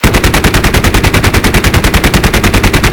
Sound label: gun, stuka; wac